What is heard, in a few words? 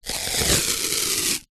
band aid plaster strip peel from roll